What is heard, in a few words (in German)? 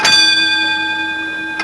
SCHOOL; HAUSHALT